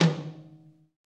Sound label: TOM TOM, Real